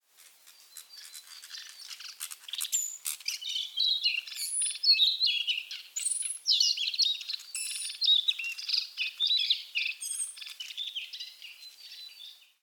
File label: ficedula narcissina twitter